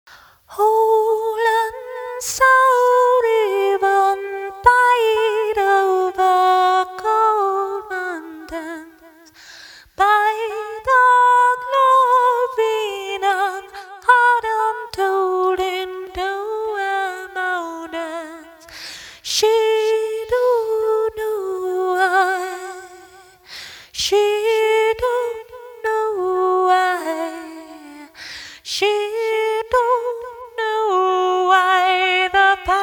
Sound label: vocal sample; vocal experimental collaboration